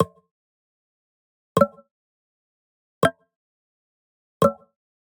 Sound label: Digital Click Neutral